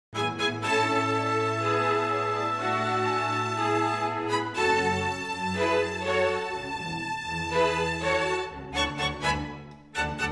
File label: Violins (stereo -1st violins panned left, 2nd violins paned right); Alan Parsons & Stephen Court - Sound Check 1993